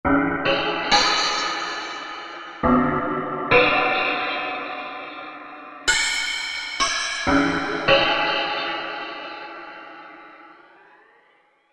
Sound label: Stormbells